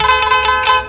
TANBURA; Best Service; World Colours

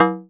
perc mod metal

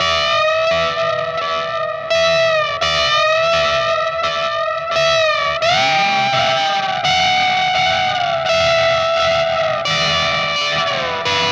Static FX Guitar

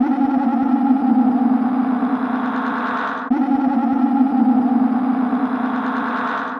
Tape delay FB Loop; radiosonics

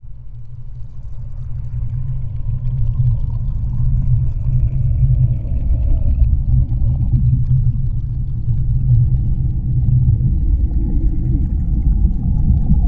DROWNING; Distored Reality

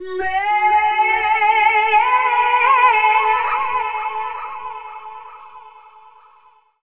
Dance Vocals, FEMALE

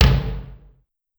Rock Tom Lo, Clavia DDrum AT, Pitched Down

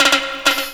drumbreak, 130

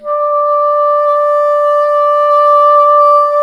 WND BSN, Bassoon

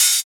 Open Hhat, Hihat; Equipped Music; Loungin House

